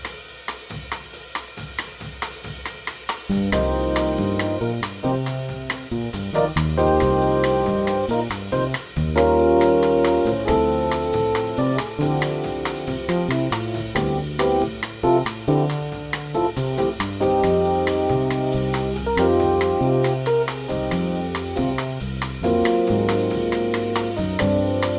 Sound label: Minor Modal Backing Track